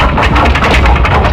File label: winch; Quake HD Ultra Quality Sound Pack Remastered 2012